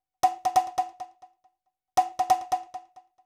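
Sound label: PITCH CONGa, Techno 138 BPM; Best Service ProSamples vol.54; AKAI; FALLING HI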